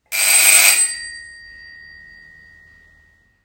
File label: old doorbell